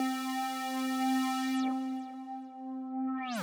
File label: Synth